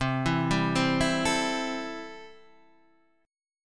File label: C 6th C, chord